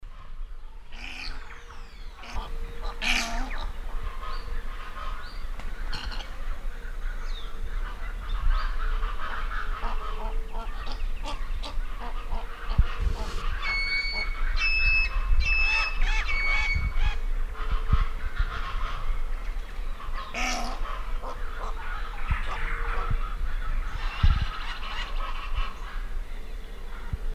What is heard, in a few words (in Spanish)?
chiflon; cantos